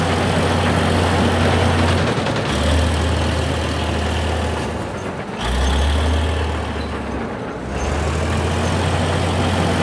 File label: vehicle frontloader drive